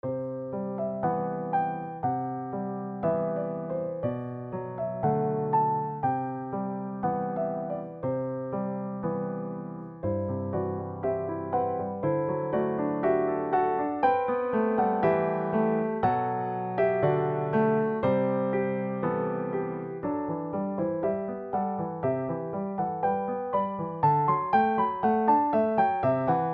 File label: Modo lidio